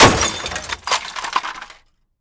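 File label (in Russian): разлетевшаяся упавшая посуда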